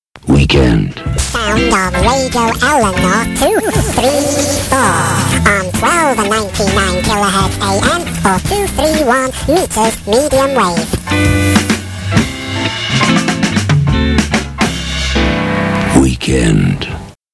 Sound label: Jingles